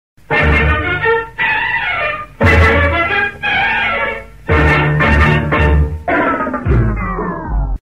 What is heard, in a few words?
tamil classic; classical